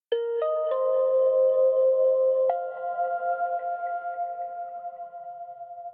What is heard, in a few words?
Tension Fill